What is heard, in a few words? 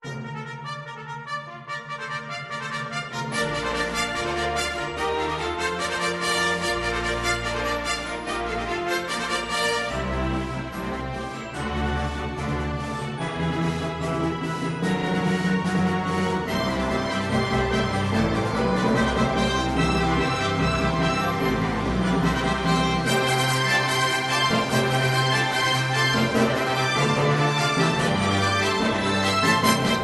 Fanfare